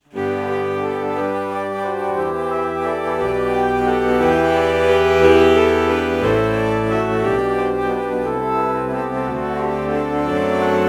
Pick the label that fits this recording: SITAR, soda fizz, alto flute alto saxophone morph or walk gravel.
alto flute alto saxophone morph